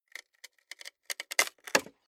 Splitting Wooden Crate With Hammer Crowbar Tearing Splintering Build Up To Break; Wood Sound Effects